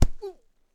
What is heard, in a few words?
Punch